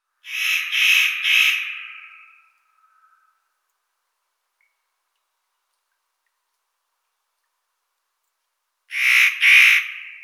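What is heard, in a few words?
nutcracker, Nucifraga caryocatactes; kedrovka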